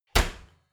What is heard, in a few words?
oven close squeaky